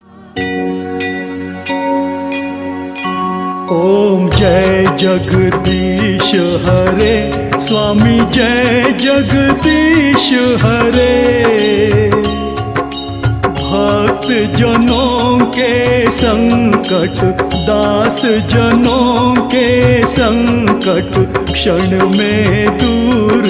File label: sai baba new telugu